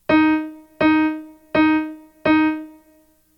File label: rhythm recognition